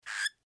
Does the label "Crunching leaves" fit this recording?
No